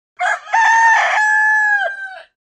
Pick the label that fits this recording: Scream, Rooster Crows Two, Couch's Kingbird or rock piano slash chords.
Rooster Crows Two